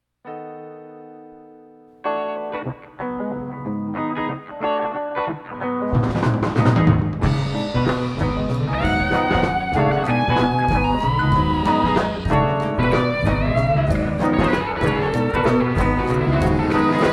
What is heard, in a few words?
Groove Sidereal